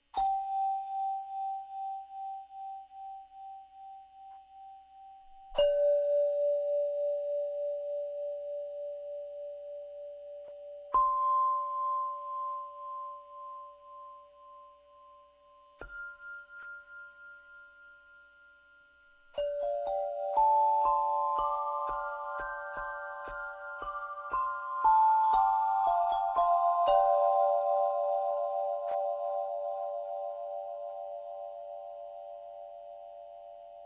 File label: cosmiphone; edmetal